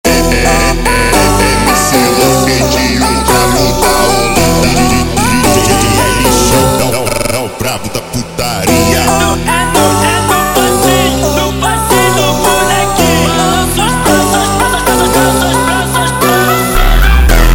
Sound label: Montagem slowed, funk